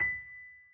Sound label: key; keySounds